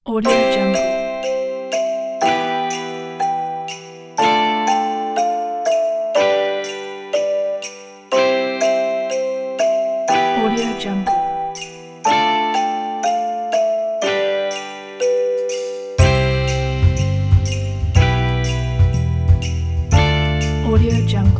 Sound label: old fashioned christmas melody